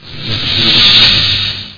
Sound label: charge; Ferazel's Wand